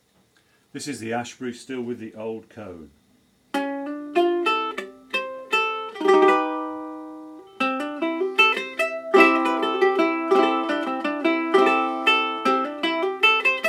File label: Ashbury old cone, resonator